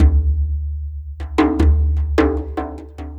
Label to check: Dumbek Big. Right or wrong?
Right